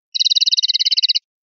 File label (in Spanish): rulada